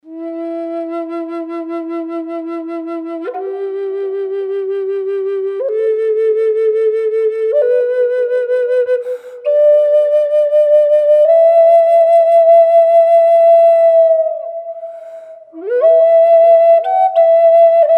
sapele em wet, flutes

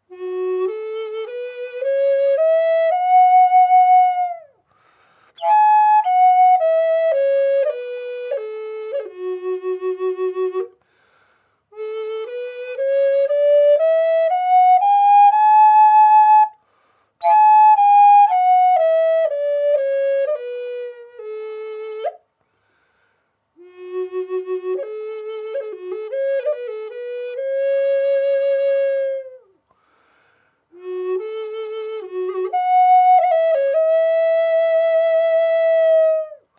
granadillo f-sharp dry, flutes

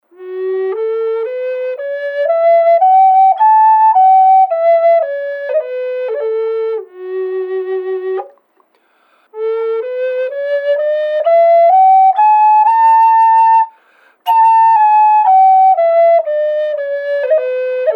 palo santo f sharp m, flutes

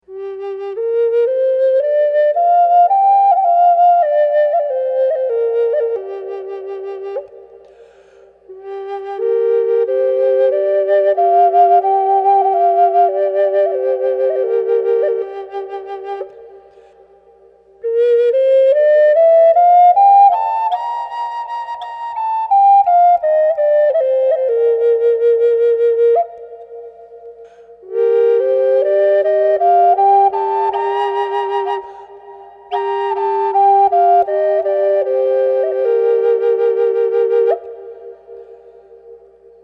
Gm Drone Reverb, flutes; drones; Laughing Crow

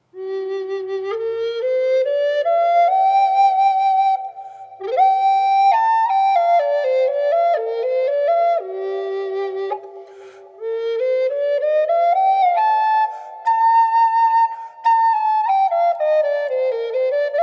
Gm Port Orford Wet, flutes

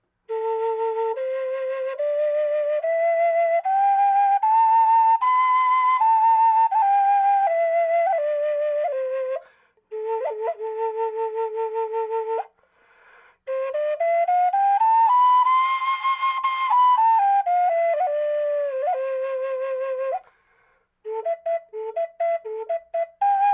am backpack flute dry; flutes; Laughing Crow

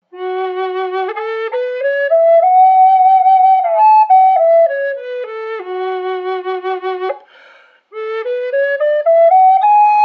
F-sharp spalted maple dry, flutes; spalted maple burl

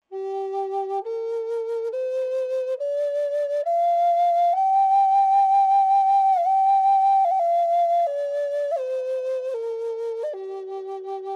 Gm Backpack Processed, flutes

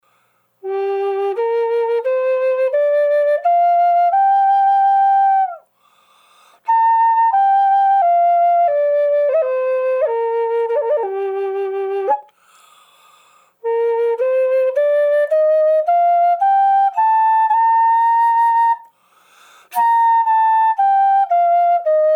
Red Mallee Burl Dry, Native American style flutes; Red Mallee Burl Gm flute; Natural "dry" sound of this flute with no enhancement.; Laughing Crow

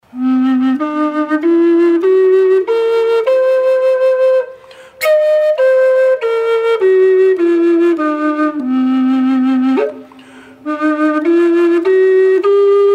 Cm wet, flute

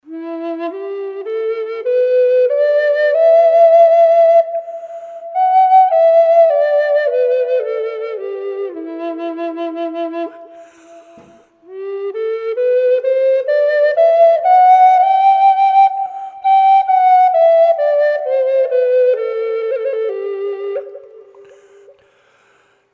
em side blown tiger maple wet; flutes

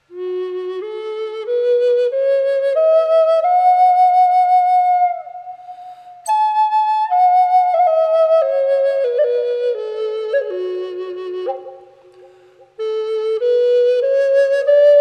amazique f sharp wet, flutes

